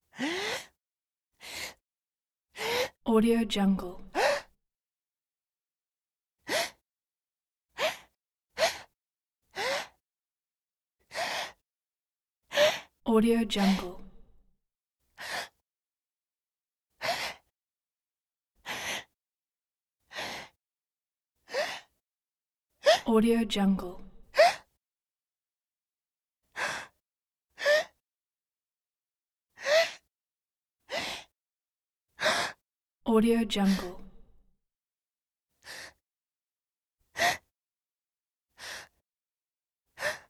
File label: female inhale short sharp inhale gasps